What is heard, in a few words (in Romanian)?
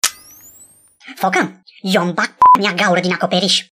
sms; RoBotzi